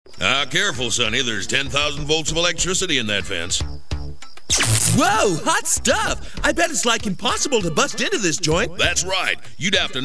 ring; megaman

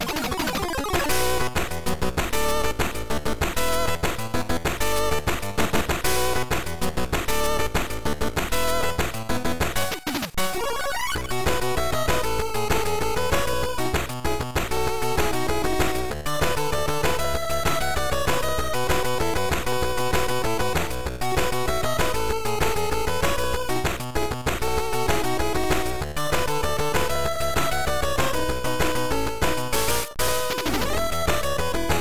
techno; tmnt3 gb

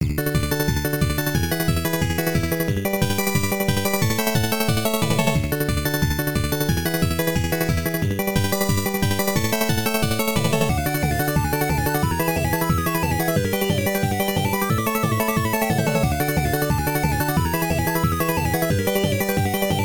battle

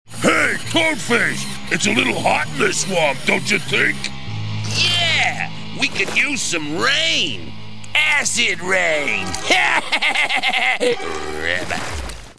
ha ha ha ribbit; megaman

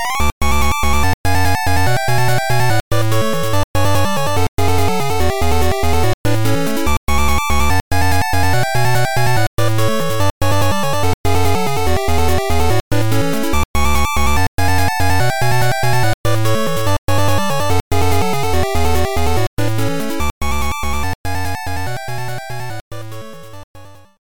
stage start

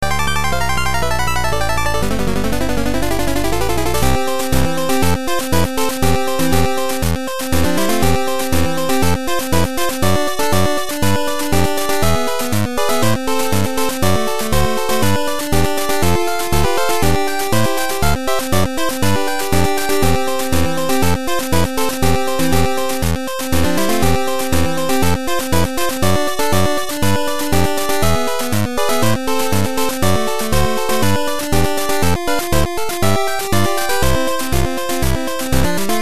Title screen; Bomberman GB; This music was recorded using Matrixz's GBS rip.

